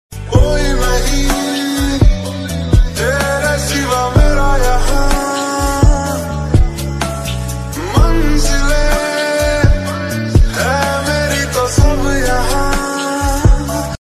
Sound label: hindi ringtone romantic love ringtone instrumental ringtone